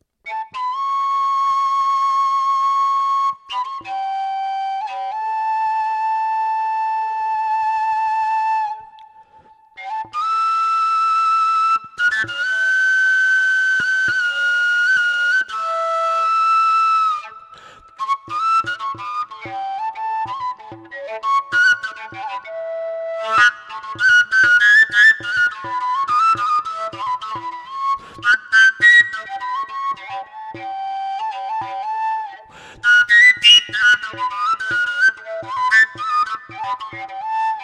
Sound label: Ov Flute Wooden A